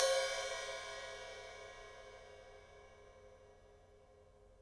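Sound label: ride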